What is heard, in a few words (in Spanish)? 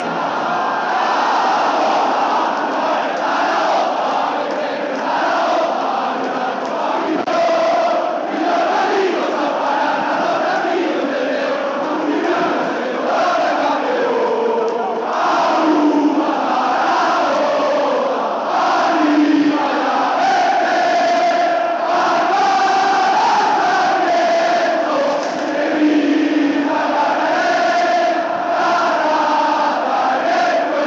himno a capella; del real zaragoza